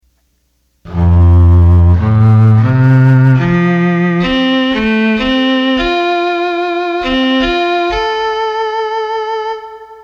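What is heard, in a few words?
Cello, Roland accordion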